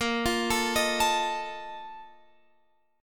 A sharp Major 7th Suspended 4th, down, Guitar, Open F; arpeggiated